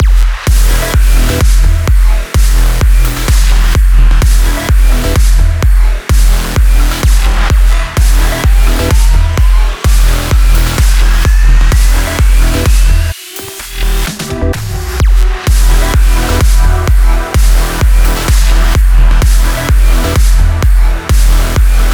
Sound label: full mix 128bpm e; starfall kit